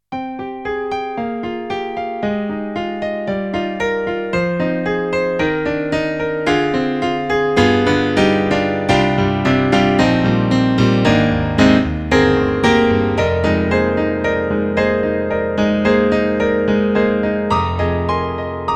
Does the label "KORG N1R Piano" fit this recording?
Yes